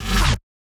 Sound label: wobble